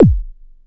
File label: Cwejman S1 Warm Kick Short